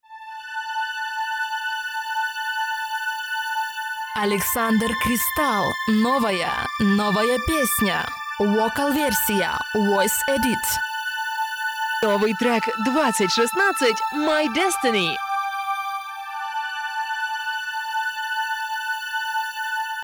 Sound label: Radio Vocal Music Version